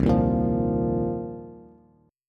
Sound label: C Minor 11th C Bass Bass Standard; strummed; JGuitar